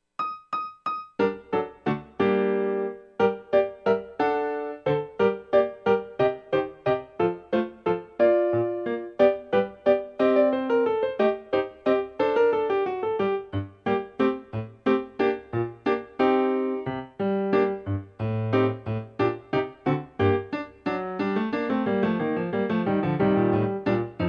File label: piano; Eccles Sonata Mvt 4